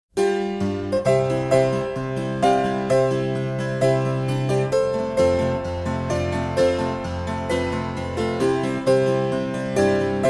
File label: PIANO SOURCE